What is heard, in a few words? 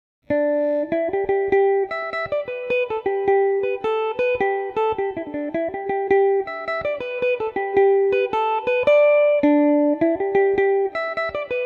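reel G major revelation, irish mandolin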